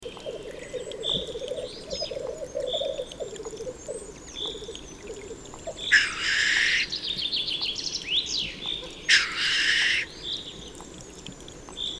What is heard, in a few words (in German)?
Birkhuhn; Susanne Rieck